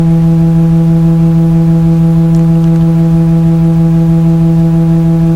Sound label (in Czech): male e principal 8 s tremolem, varhany; englerovy varhany